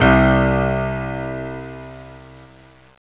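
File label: grand piano C6; Alone In The Dark Demo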